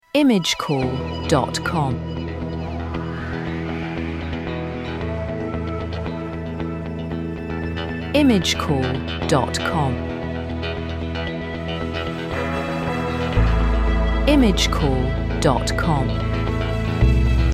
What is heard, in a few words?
stock audio beat